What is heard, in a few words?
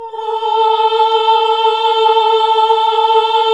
OHH A, Nuns Ooohh; Sound & Vision - Gigapack II CD 1 (Roland); HAL NUNS AAH OOH